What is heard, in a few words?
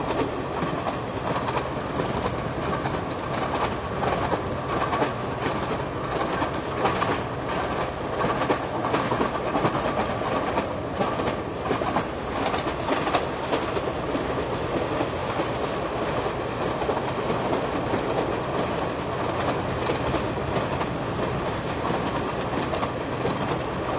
train exterior, backgrounds; Secret Agent